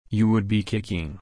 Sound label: kick